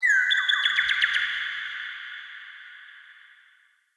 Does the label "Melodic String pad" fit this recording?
No